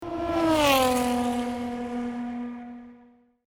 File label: Racing SE